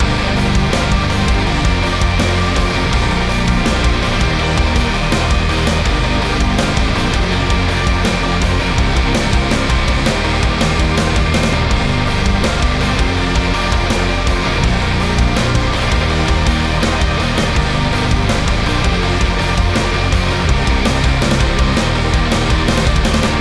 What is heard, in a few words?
Chorus Full, modern metalcore